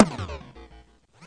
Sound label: player death